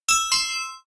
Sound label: Ding Dong